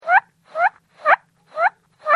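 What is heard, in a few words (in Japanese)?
琴ヶ浜の鳴り砂, きれいな倍音構造になっていることが分かる。基音は750Hzで、そのオクターブ上は1,550Hzであり、3倍音は2,300Hzである。; 2003年１月1日、琴ヶ浜の鳴り砂。速く突いた音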